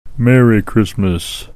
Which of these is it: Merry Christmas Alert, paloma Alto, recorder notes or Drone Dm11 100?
Merry Christmas Alert